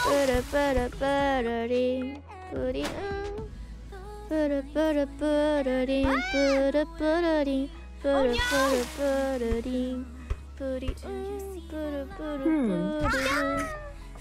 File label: Singing; IRyS